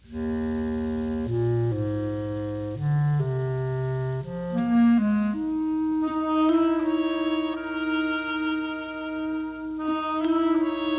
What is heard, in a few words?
bassoon think; bradybunch